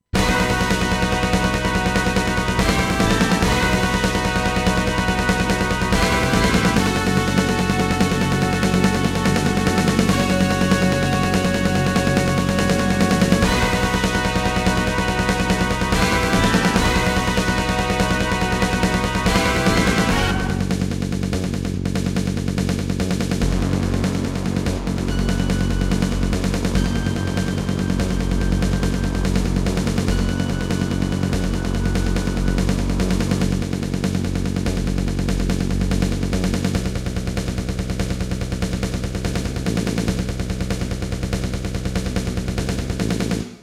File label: SC880; Dune 2